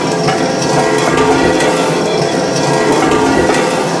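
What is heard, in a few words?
Metal Roll Loop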